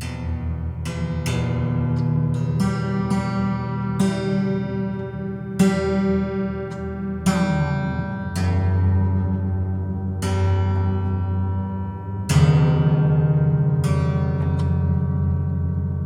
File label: Detune, Piano; Piano Destruction